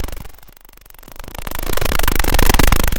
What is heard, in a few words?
dragon fly; Vehicles; mounts; freepixel